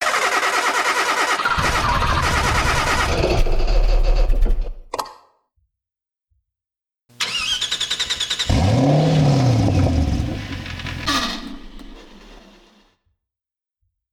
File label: engine